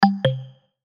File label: Chime Musical, Chime Musical Multimedia; Web Interactive; Chimes (Sound Effects:Multimedia); Blastwave; Technology